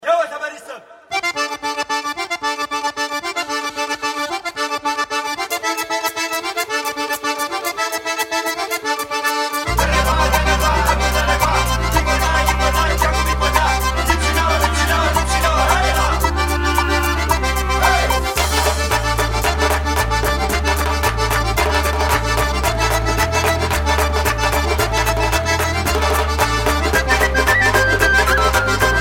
Circassians Music

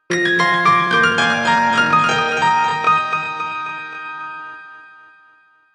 Nokia Tune Remix

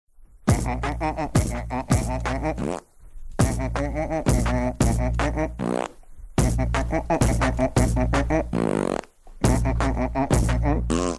Pheew Beatbox

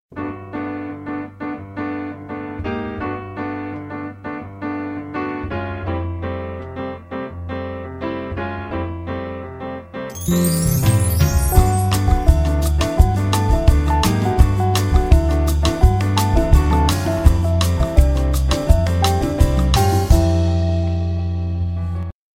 Bossa Nova

